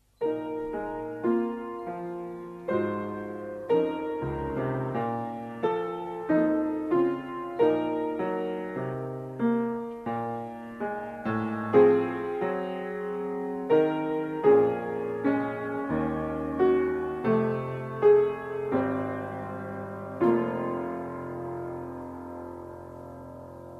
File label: piano